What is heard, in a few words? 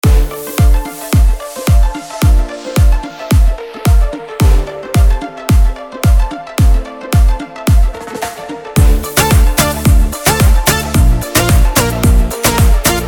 Remix Song; Punjabi